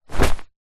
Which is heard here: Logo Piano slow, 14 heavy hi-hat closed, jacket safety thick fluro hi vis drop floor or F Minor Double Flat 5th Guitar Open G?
jacket safety thick fluro hi vis drop floor